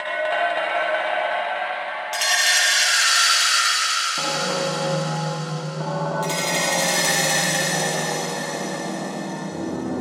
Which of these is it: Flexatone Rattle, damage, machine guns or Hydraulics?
Flexatone Rattle